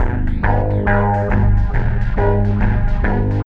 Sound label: Industrial sludge; Sonic Foundry (Sony Creative Software)